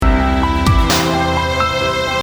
Violin; up or down quiz

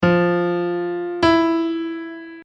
Intervals

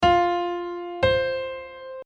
Intervals; intervals 5 through 8 quiz

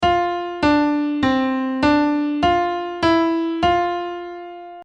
Playing in F; piano quiz

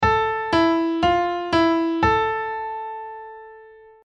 Minor Going Down; minor key going down piano quiz

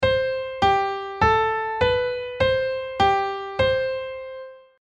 Major Scale

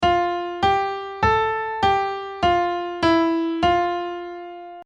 Playing in F; piano quiz

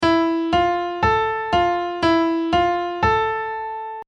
Minor Scale Piano

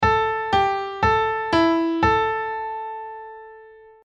Minor Going Down; minor key going down piano quiz

